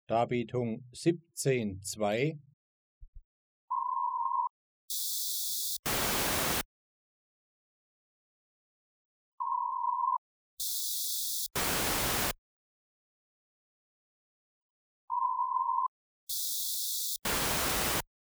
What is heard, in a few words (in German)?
Schärfe, Die Schärfe eines Tones bei 1 kHz